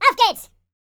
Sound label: german, YODEL YELL; ILIO; Vocal Planet